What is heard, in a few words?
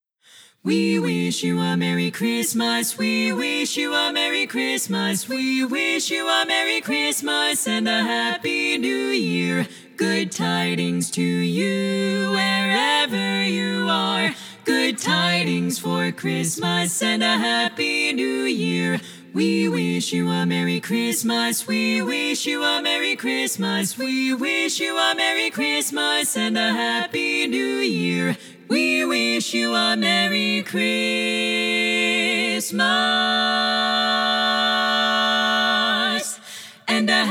We Wish You a Merry Christmas (SSAA); LEAD